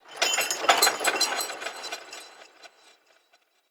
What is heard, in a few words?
Sky Fortress Chain Move Trippy Low; Ode Sky Fortress; Tunnel